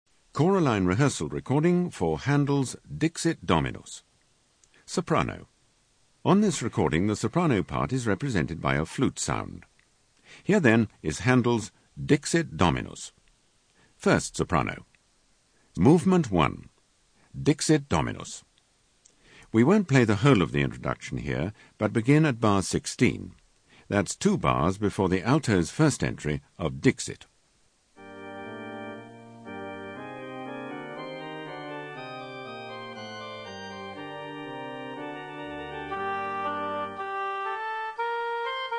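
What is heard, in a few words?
Soprano; Handel Dixit Dom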